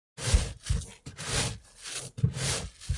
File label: dig land